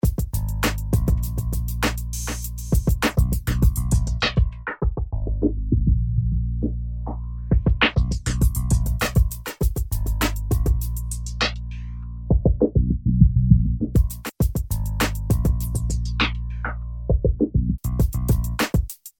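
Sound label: Loop Delay Filter Flanger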